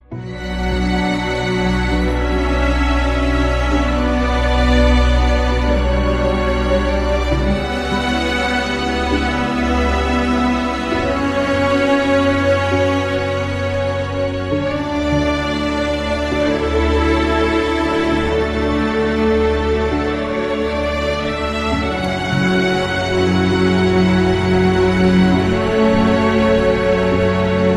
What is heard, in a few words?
Emotional Background Music Ringtone; ringtones